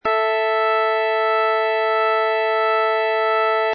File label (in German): Quinte; Tonsystem